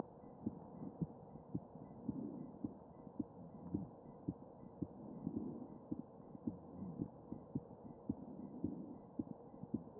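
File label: anterior chest, position 4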